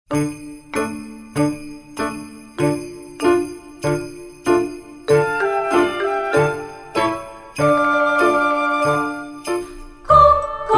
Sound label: Japanese Christmas song